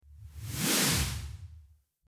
swoosh

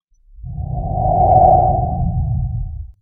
Portal opening

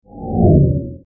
Car passing by

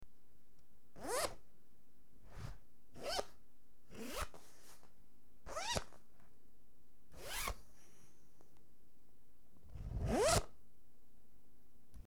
Zipper

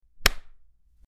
Slap

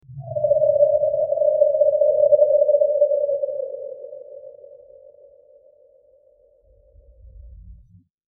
Alien jungle

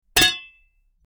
Metal clank

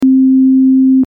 beep

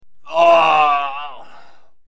Groaning in pain

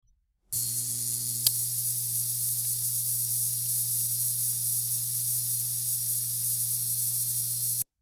Neon sign buzzing

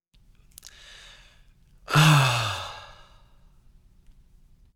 Man Sigh

